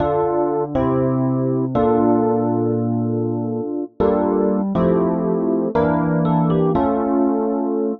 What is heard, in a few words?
Chord El Piano Dm, 120bpm; Zero-G; Extra Chord Progs